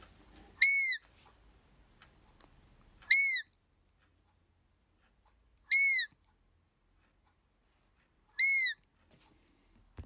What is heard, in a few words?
Roe Oakwood